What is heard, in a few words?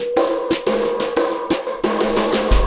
Ripple Perc; Sonic Foundry (Sony Creative Software); Processed Drumkits Zero Gravity Beats